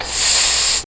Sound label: smoke